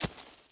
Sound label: dirt